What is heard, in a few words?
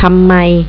PERC